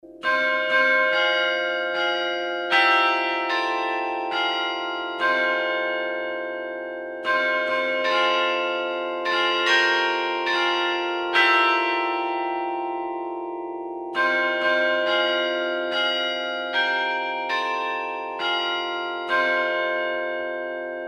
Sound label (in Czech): zvonohra; k nebesum se orla vzletem; zvonohra pisne